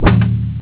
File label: attack; Ronin